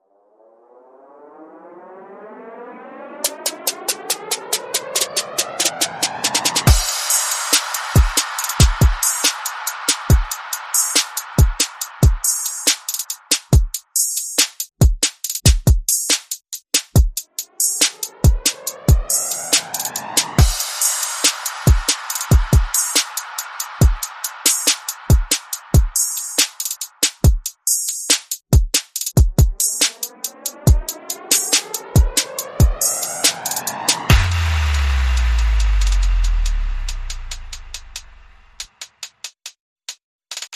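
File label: Percussion and FX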